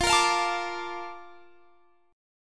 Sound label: A sharp Major 7th F